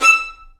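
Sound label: Violin Spiccato E5; Multisamples; Digital Sound Factory Virtuoso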